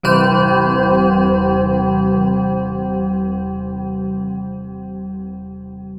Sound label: CLOC; Back In Time Records; Big Bang